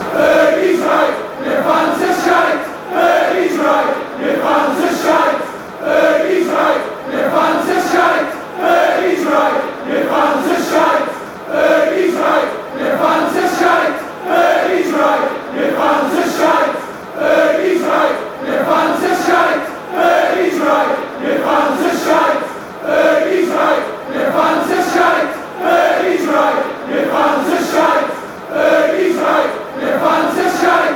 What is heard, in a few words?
fanchants; fergies right mcfc